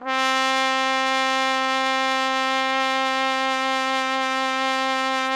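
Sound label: TPT, trumpet; Roland - LA Composer vol. 3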